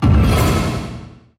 void success

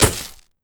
bulb flash

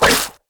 whip

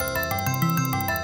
spin loop, slot; greed; slot eyes

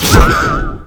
wierd worm spit

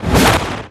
hole rise up; littlehorn

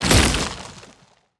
popup

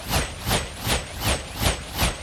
spin to win

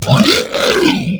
boss spit blob barf

